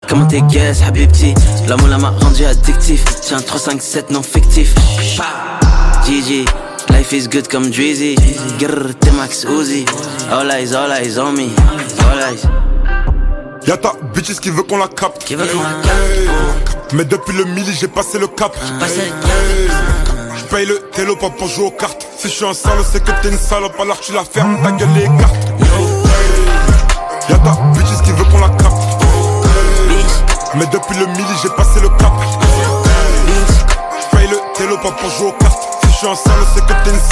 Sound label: Drill FR